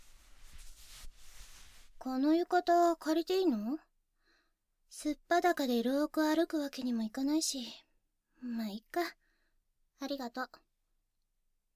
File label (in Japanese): 耳舐め; 桜少女の花蜜耳舐め